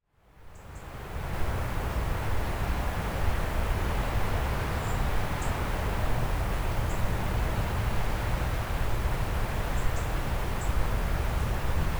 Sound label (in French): mer et gabians Lérins